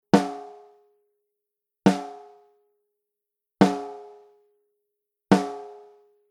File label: snare drum; AEA RPQ500; preamps